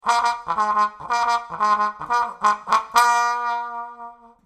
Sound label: Trombone